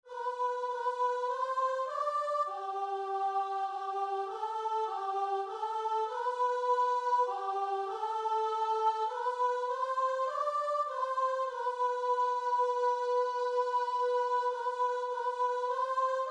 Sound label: night dark, guitar and vocal